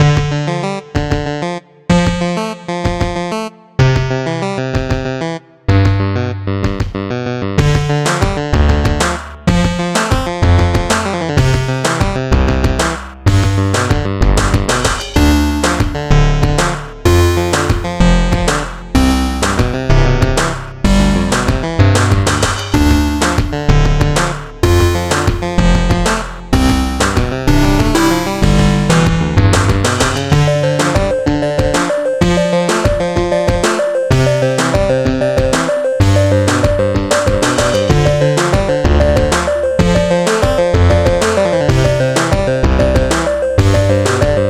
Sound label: Tower 190 bpm; super stone legacy